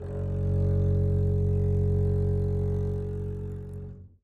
gb1 down, bass arco; meatbass

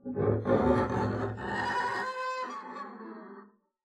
arco noise moan pig long, double-bass

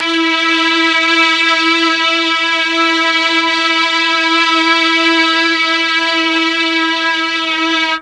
E2 16 VIOLIN, mellotron; TRON 16VLNS

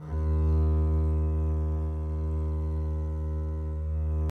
eb2 down, arco looped; meatbass

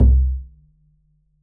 perc, pizz; meatbass

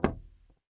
noise muted strum, swag bass

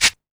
Cabasa, drum machines, Drumtraks

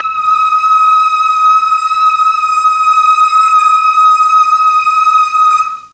E4 boys choir, mellotron

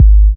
bd klub, kick; drums; one-shots

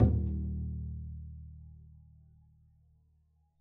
pizz eb1, double bass

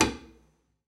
pizz noise slap, double bass

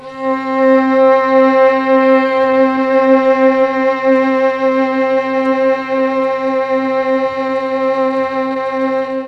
C4 300 VIOLA, mellotron, STRINGS

